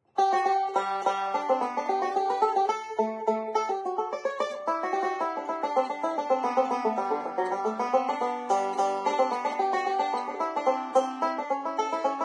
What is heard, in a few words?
Banjo; Zomboid; Lifestyle